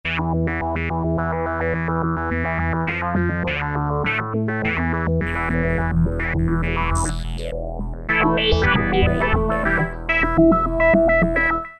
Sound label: Sfx S-H, E-mu Morpheus; Factory Presets